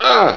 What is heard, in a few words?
pain; wanted